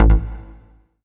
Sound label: dance, bass